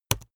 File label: keyboard laptop enter press fast hard